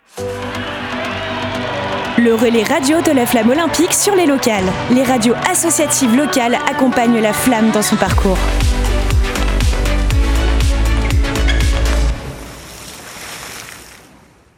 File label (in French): Jingle; Flamme olympique